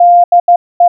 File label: de, morse code